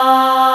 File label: Aahs C3; Proteus 2000; Digital Sound Factory Proteus 2000